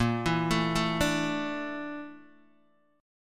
Bb Major 7th Flat 5th Bb Guitar Open D; x,1,2,3,0,0

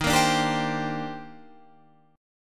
D sharp Major 13th D sharp Guitar Open F, chord; D#M13; strummed

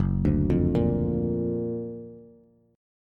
Gb Augmented Major 7th, down, Bass; arpeggiated

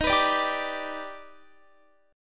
Eb Augmented Major 7th Eb, Guitar; strummed; x,18,21,19,19,21; 4E,4A,5D,5G,5C,6F

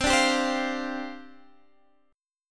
C Suspended 2nd Suspended 4th C Guitar Standard; strummed; JGuitar

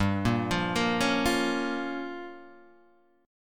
G Minor 7th Flat 5th, Guitar Half Step Down

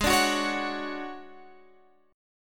D Major 7th Flat 5th G sharp, chord

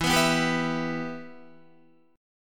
E Minor E, Guitar, 4F,4A,5C,5G,5C,6E, Em chord; Em strummed; 11,14,11,12,11,12; JGuitar

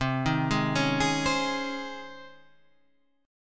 C sharp Major 7th Suspended 2nd, C

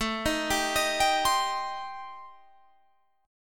A Minor 7th Flat 5th A, Guitar, Standard; Am7b5 arpeggiated; 17,18,17,20,20,20

